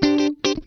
Funk Guitar; 90 s Sample CDs; Zero G

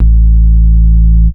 sub A, techno trance bass

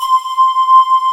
SQUARE LD, JV-1080; Syntec; Wall of Sounds

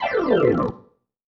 Slide, Organ, Loops; Sonic Foundry (Sony Creative Software); Joe Vitale Organ Donor; Slides

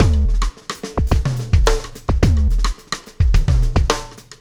Sky Lab Beat, Processed Drumkits

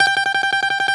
Balalaika, Prima Balalaika, Plucked Strings

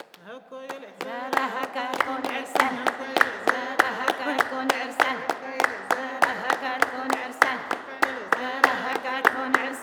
FADED WMN, CLAPCHOIR; Spectrasonics; Heart of Africa 2

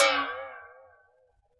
BOX FX; Univers Sons - Raricussions

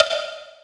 Big Conga; Zero-G Sound Sense Grime Scene; GS Kits

